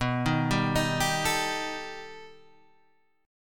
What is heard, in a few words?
B Augmented 7th B; B+7 Chord {7 6 5 8 8 5} arpeggiated